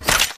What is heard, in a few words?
ammobox ammorefill; coop; Titanfall 2